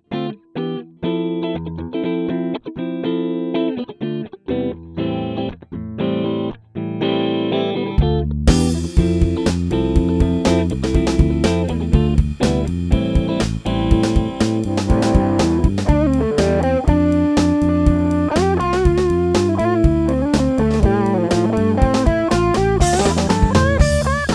Interner Lead Sound

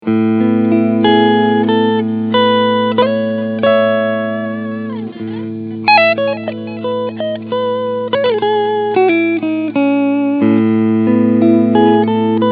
Cort X-700 Duality E-Gitarre Cleansound Front Humbucker

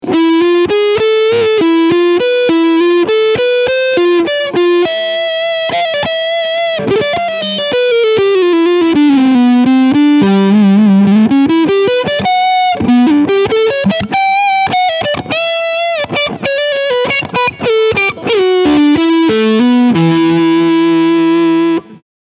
Leadsound Position 4

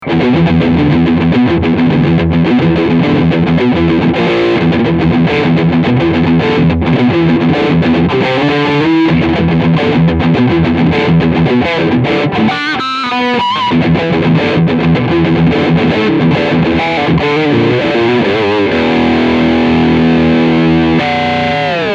Riff Steg Voicing A

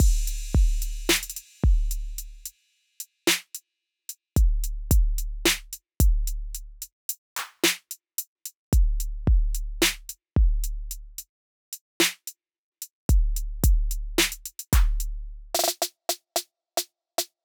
Drums Pur

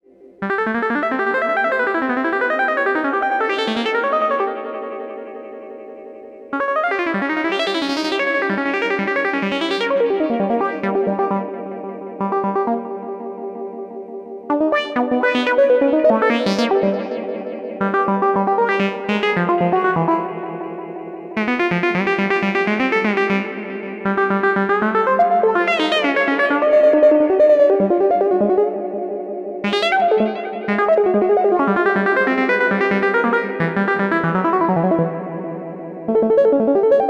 wilde Arpeggios mit Delay; GRP Module

